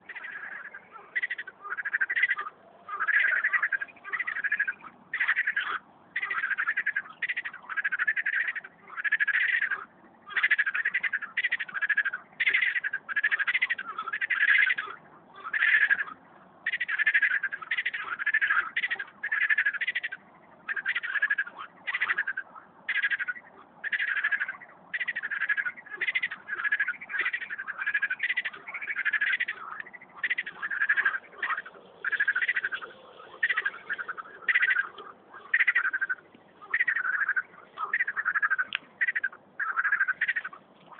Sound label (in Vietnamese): những con ếch nhỏ trong ao